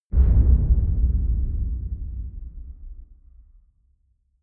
container hallow; whooshes rises; hits impacts

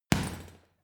boxing punching bag hit wiggle; boxen

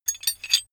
metal handling pieces

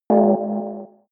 bing delay low; kommunikation; signale

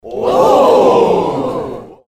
little group ohh

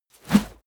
whoosh dirty; swooshes-swishes

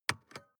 sports car int switch press and release; autos; verkehr transport

